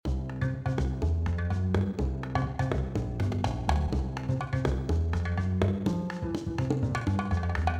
Bongos Loop

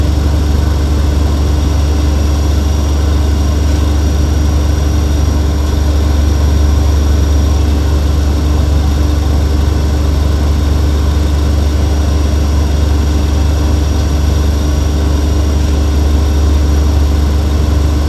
generator loop; asylum altar; Devour 2021 gamerip pc macos

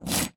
Skill Slash; portal quest android; Magic Shrek Skill1

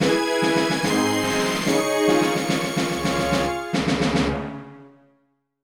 Jingle Success

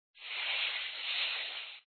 ice steps

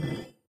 Spike; Doodle Jump 2

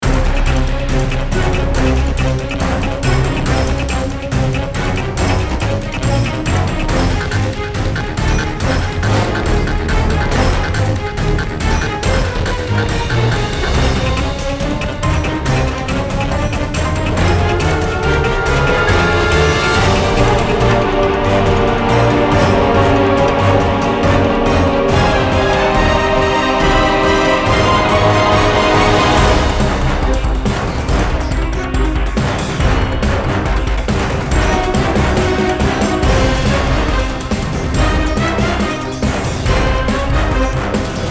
General Combat 140 BPM; Disney Infinity 2.0 Marvel Super Heroes

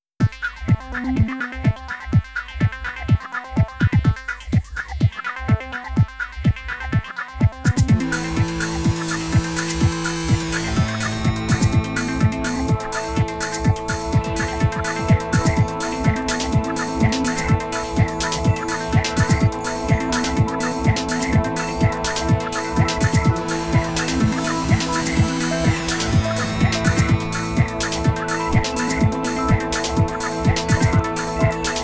Electronic Ocean; Natsuiro no Sunadokei

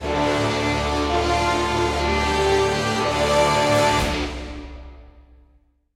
Stinger Tower Explode 100BPM; Naan Escape

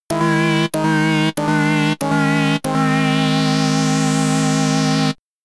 Game Over (SID Stereo); B-Bobs; commodore 64

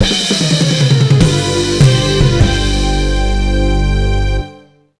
midi LOSE; bully scholarship edition; Arc Race MX

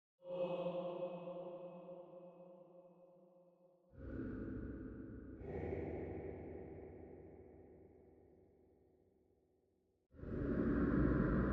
Ambient Choir; Five Nights at Wario's 4

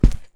Footstep; backrooms 2d android ios online windows gamerip

